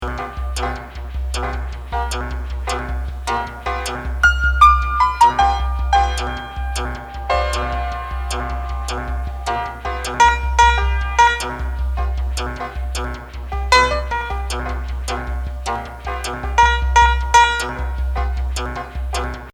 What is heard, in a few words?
arp klavertonk